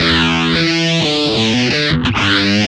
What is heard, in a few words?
GUI 90 LICKS